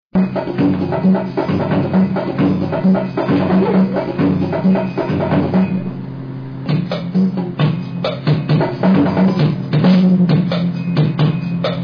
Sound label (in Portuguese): bat gaita